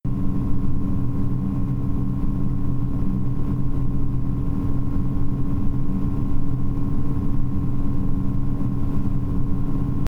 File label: Max RPM